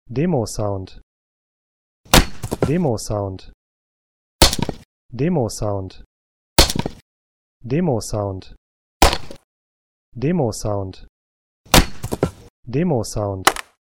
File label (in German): Holzhacker